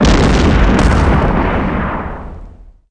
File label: Helicopter Explosion